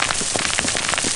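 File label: gravel skid; surfaces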